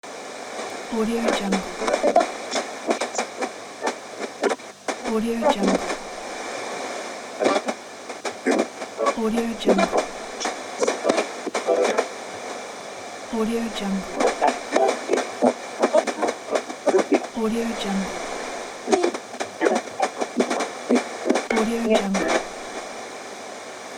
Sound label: tuning radio across band noise